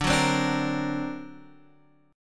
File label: D Augmented 7th D down Guitar Standard; D+7 strummed; JGuitar